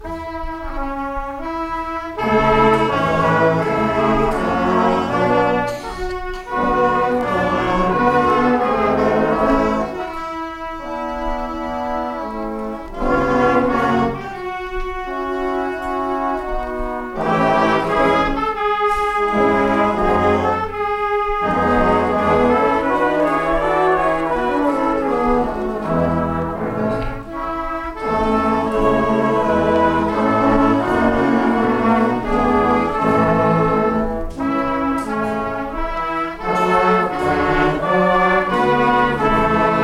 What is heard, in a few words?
Fanfare; Le Seigneur est mon berger